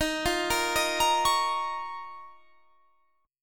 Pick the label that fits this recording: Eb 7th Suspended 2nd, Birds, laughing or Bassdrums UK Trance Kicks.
Eb 7th Suspended 2nd